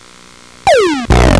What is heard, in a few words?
shot self